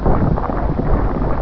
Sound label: THUNDER; Het Weer